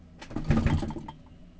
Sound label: move, tavern; AMBIENT; Pirates Of The Caribbean